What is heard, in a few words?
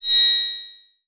Beep High Pitch